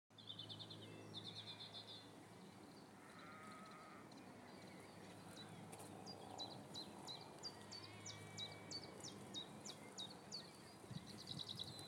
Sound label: ambient sounds of burmington